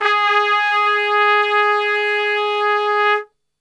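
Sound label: G#2 trumpet straight, Brass; Best Service; Brass Super Section; TRP STRGHT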